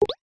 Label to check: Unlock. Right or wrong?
Right